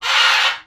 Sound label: parrot